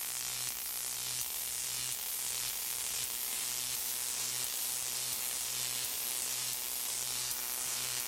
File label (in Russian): zvuk elektricheskogo napryazhenij